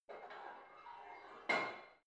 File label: barbell heavy plate put on soft reverby, fitnessstudio; sport freizeit